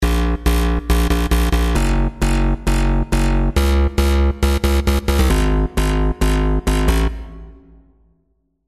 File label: COMP BOUNCE BASS